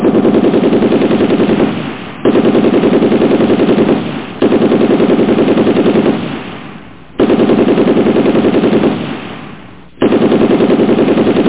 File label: RIFLE